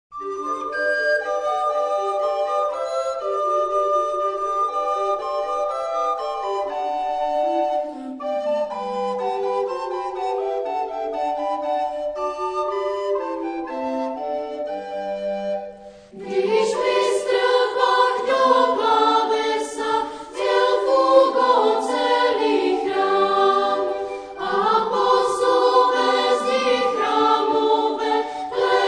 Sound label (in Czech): Komorní sbor, flétnový soubor; Bel Canto